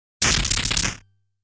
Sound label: The sound of the boss breathing fire; dondokodon